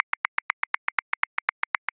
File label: Hip Hop Subtle 16ths 80 bpm; Grooves; Ableton Live 12 Lite; Core Library